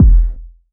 BD, BASS DRUMS; EdgeSounds

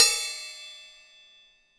Drum Dry; East Collexion; Drum 1

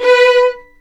B4, VLNS ARCO; East West; The Ultimate String Collection

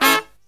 low chord, brass riffs

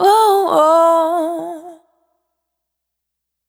Medialis, Single Voice Melodic Loops; Singles

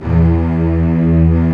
x Synth Str, Roland; Club-50 - Foundations

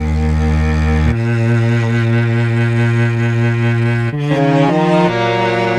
Mutated Cello; Cinematic Funk; Sonic Foundry (Sony Creative Software); Cinematic Funk Urban Tone Poems

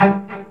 Pizz; Mo' Phatt; Digital Sound Factory

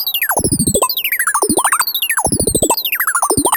ALARM, SCI FI ALARMS; Ambient; Zero-G; Ambient 1

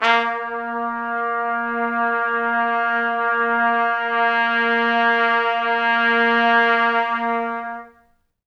A#2, Pop Brass; Best Service; ProSamples vol.19; AKAI

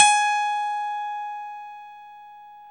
KEY MKS P30, EP + E.Grand

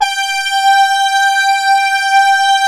SYN, Analog

